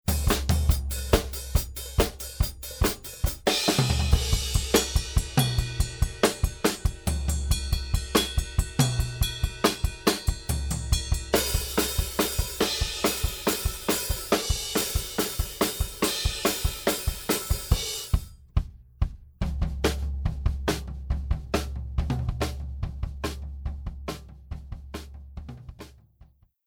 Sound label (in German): Drums Summe dry